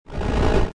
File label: Player engine